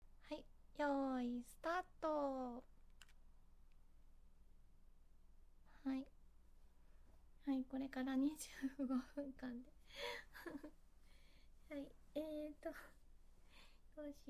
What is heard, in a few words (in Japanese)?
永続的な叫び声を響かせながら連続絶頂, アフタートーク; 25分で何回イけるか(7); 連続絶頂で狂い散らす! 本番＆アフタートーク